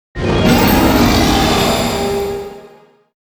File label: 3DS Menu Jingle; Pokémon Sun; Pokemon Sun Moon Ultra The Definitive Soundtrack